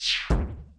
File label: In Fly; Worms3D